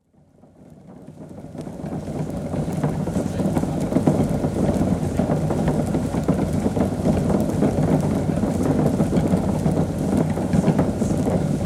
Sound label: Brewery, Jacobs ladder, with occasional distant voices; Restaurant Sound Effects; BBC